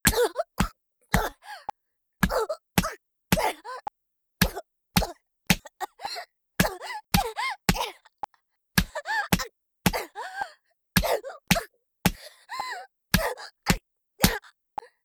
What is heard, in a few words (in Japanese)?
ストレートメト(威力高め) BPM110 ワンツースリー